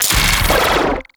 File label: Ball Time Bomb Explosion; Warframe; Tenno Ball; Missions